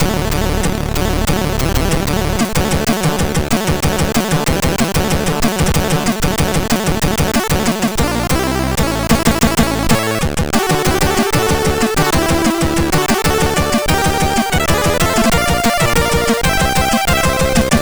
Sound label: Opening sid CSG8580R5; Street Fighter II